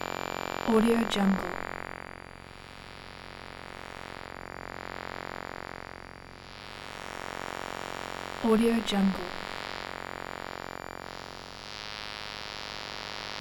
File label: static; umcsound